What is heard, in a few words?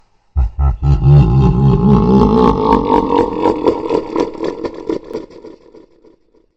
nightmares laugh; fnaf